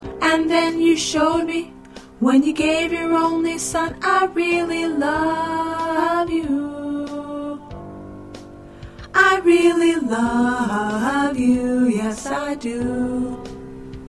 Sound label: Tenor